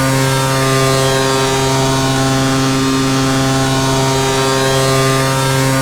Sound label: KART IN ON MID